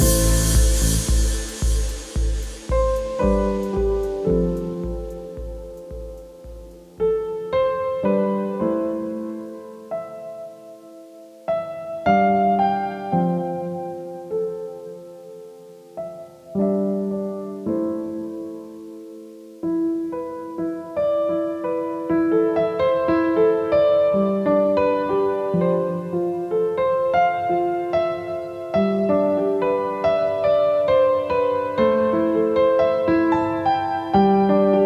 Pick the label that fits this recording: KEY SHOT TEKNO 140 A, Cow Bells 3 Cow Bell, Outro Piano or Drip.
Outro Piano